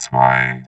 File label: VOX VOX, Electro Vox